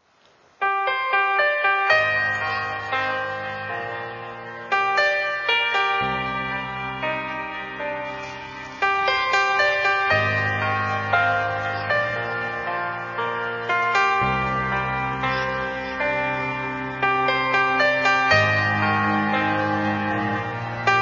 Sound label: Summer Rain Rework; udachnoj nedeli